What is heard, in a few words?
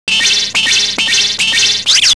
boings